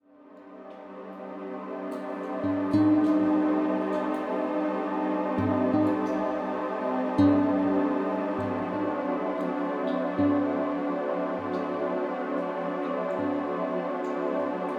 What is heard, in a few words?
Exam Study Music Chillout, Brainwaves